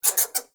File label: Exterior Forest Critter; Ambience; Duviri; Warframe